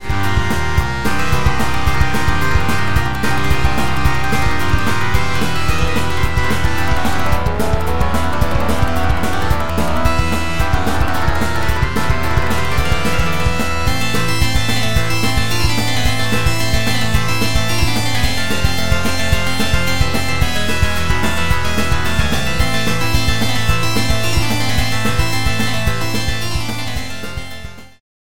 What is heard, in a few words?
gritty overdriven